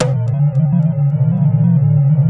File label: SPACE SNDS; Pro-Rec - Synthsonic; JV FILM